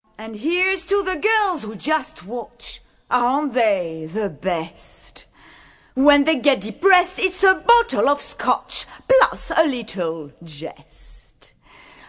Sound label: Belt, Accapella, Songs